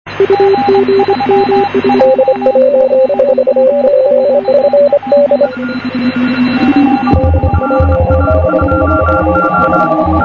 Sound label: DCRX CW; juma rx1